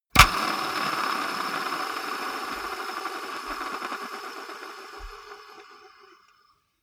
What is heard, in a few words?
gaming mouse wheel scroll smooth hard